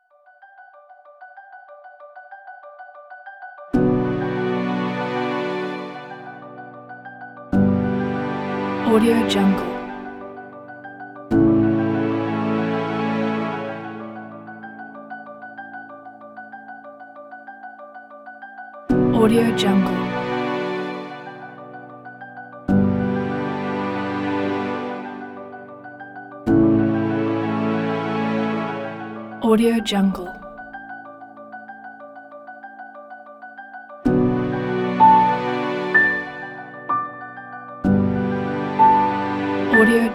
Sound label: sad slow background; jan-kortanidze